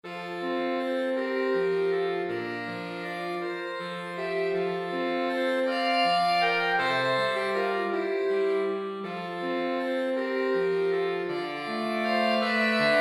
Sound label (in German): saxophonquintett